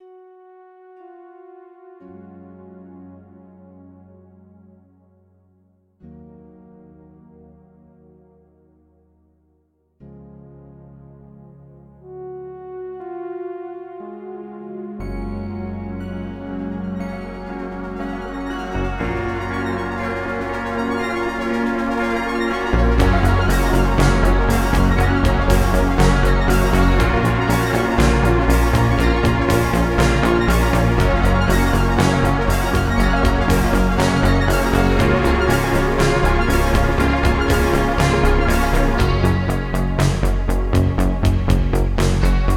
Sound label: 120 Bpm; gamerip